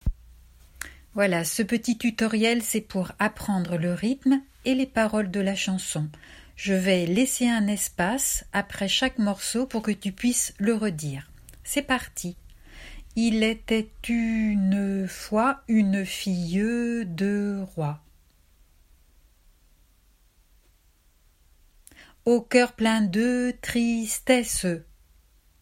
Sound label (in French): rythmes et paroles